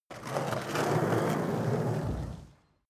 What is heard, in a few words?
skateboard indoors drive heavy; sport freizeit